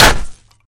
bat impact world, weapons

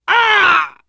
hurt critical

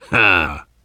laughter, survivor voice biker

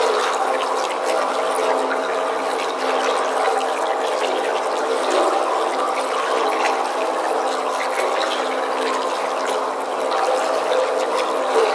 tunnel water loop, ambience; Left 4 Dead 2

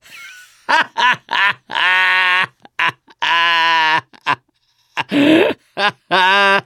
laughter, mechanic, survivor

